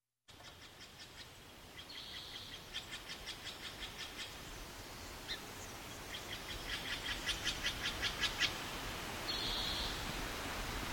Lake Morning

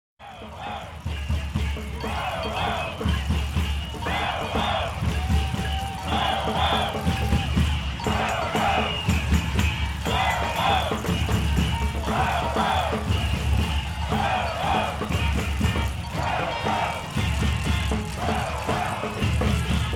Dinner at a Pub

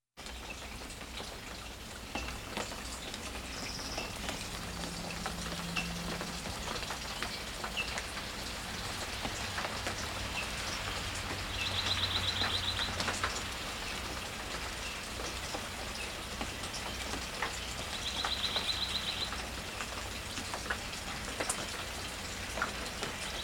Drain Flow Dawn Chorus

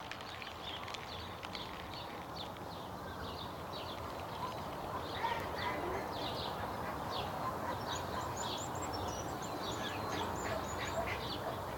Wetlands and Bush Distant Highway

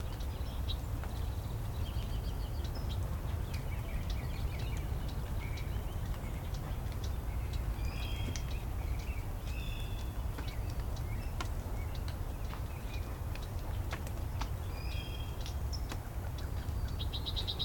Dawn Chorus with Drips

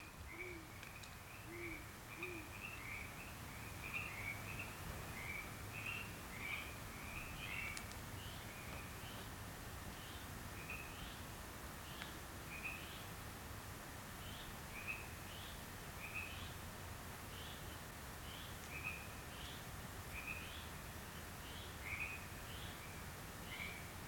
Owls and Frogs

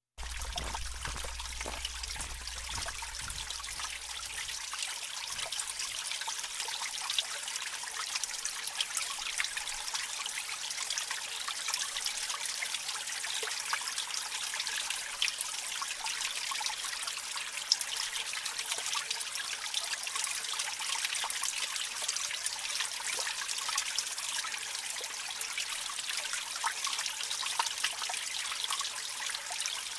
Trailside Trickle

